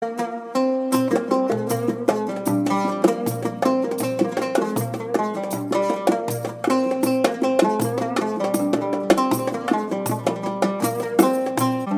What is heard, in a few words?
Arab ringtone